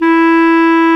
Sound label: clarinet, Clarinets